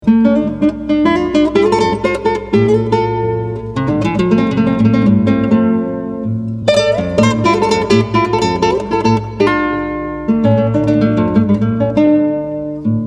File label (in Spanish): Huérfano Pajarillo (Huayno)